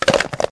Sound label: coelacanth damage